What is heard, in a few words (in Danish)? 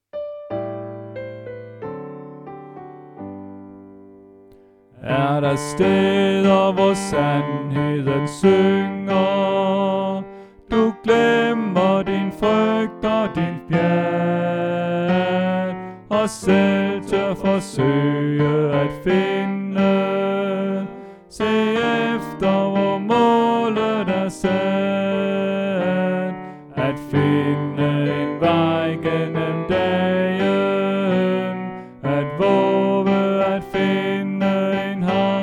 Tenor; oevefiler